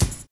SERIAL BD; Univers Sons

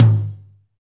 TOM TOM, Roland

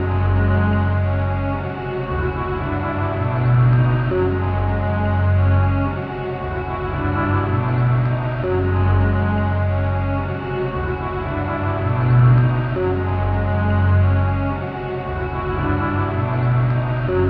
Ethereal Autumn; Zero-G - Ghost In The Machine

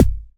KCK, Yamaha TX81Z synth drums

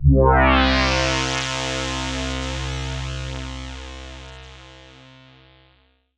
PAD C2, Roland MKS-80 synth pad; Pads

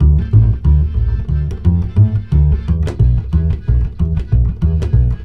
BASS, Balkanish Traditions

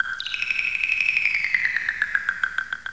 FX; AMG Megabass REMIX! VOL-2

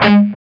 A 4 SOLDAN, Guitars, SOLDANO ROCK; Spectrasonics; Hans Zimmer Guitars VOL-1

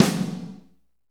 SNR, Drumscapes Roland

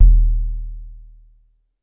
Drum X Sub, Pearl Drum-X

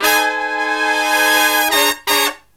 SLEEP, Killer Brass Riffs

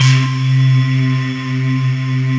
CRYSTAL C2, BELL, Synth

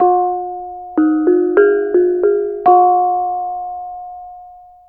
BONANG; Spectrasonics; Heart of Asia; BONANG PH

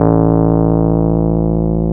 PNO, Electrics, Rhodes

